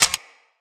Security Camera Motor Start

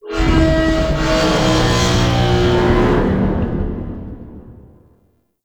Assassinate Escalation Tank Vocal; Warframe; Nineteeen Ninety Nine

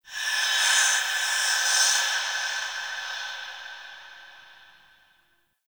Breaths, Ambience; Warframe; The New War; Zariman

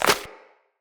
Agaric Mushroom Grow; Resource Materials

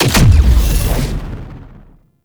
Sniper Punch; Weapons; Warframe; Sentient; Caliban Prime Sniper

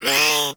Catbrow Feral Vocal Pain, Enemies; Warframe

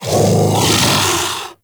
Kubrow Armored Vocal Alerted; Warframe

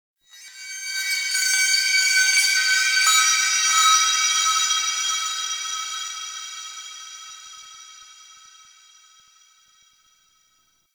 Metal Chimes; Ambience; Warframe; Nokko Colony

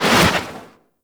Operator Focus Dash Wave; Warframe; Operators; Tactic

